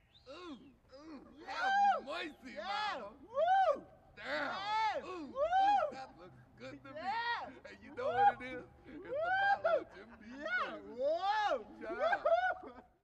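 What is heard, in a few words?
Male Catcalls Exterior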